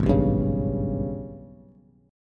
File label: Ab Minor Major 11th Ab, Bass Bass Standard; strummed; JGuitar